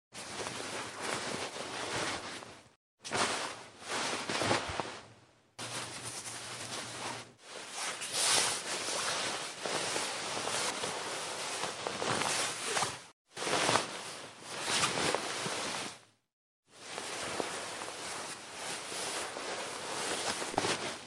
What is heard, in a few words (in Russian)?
shorohi